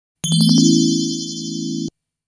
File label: Coin; Galaxy Force II